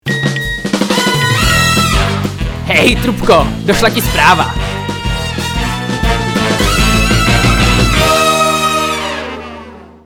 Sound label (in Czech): trubka; SKAUT; team fortess